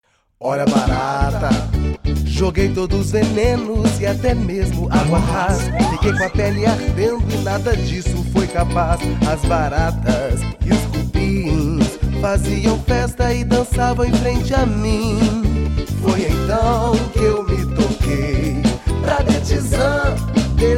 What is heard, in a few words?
jingle; Detisan - Controle de Insetos e Roedores